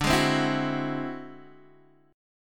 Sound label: D 9th Flat 5th D down Guitar DADGAD